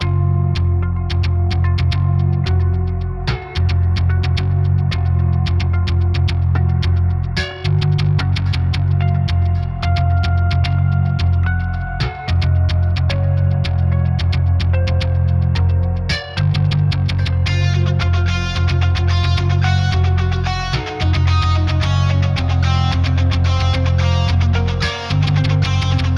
cinematic elect Guitar building Power Chords Fm 110bpm